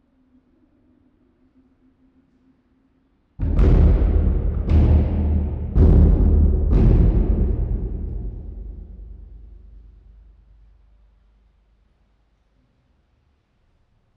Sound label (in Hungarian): plexifalat oklevel veri, majomhaz; veszpremi zoo 2013